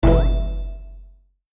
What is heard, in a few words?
match; Dora and the Lost City of Gold - Jungle Match